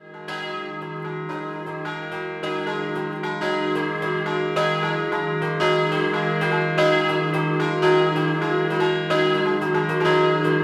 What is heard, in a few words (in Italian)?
Billerio Scampanottata